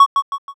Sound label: cursor